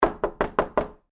Foley Door Knock Hollow Door; Knocks; Objects; SFX Bible 6

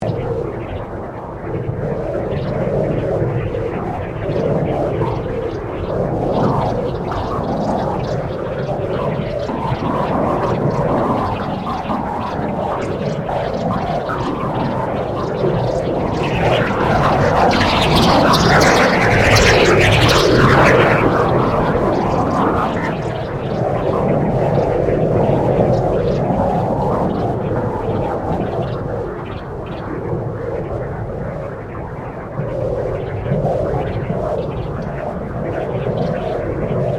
CREATED AMBIENCE EVIL WINDS; Artificial; Various ambience created through sound manipulation in FMOD

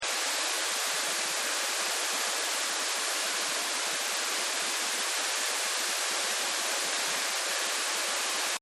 Rain Hard; Weather

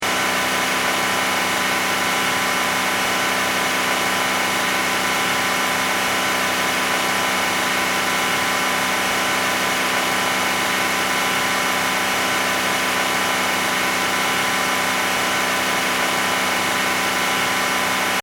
Car Mercury Sable High Rpm Loop, Engine Loop; Power Car sounds

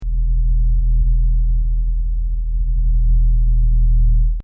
Blue whale; Animals

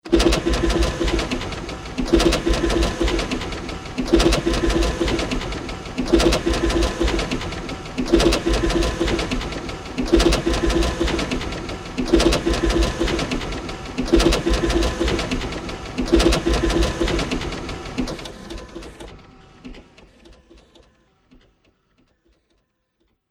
Intergalactic Industry Background Machinery; Sci Fi

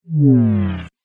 Space Metal Sound, science fiction sound effects

